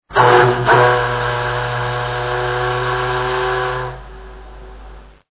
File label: factory whistle